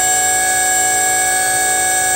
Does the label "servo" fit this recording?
Yes